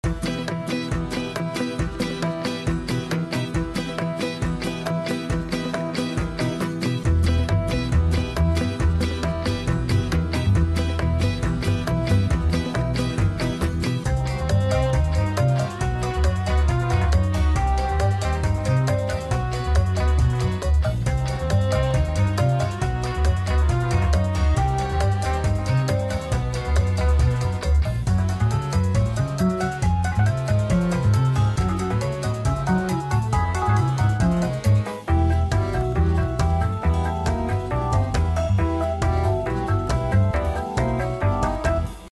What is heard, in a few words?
Boss fight